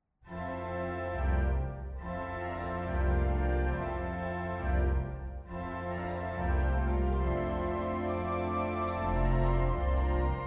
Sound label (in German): Freie Improvisation; Lobe den Herren